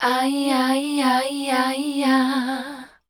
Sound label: C, Voice Spectral; MELODIC; Best Service; Voice Spectral II